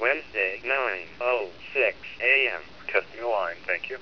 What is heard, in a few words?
line test